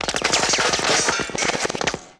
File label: Machine Gun Ricochet Multiple